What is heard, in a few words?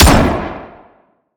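Sci weapon Shot Medium Gun Chamber Rattler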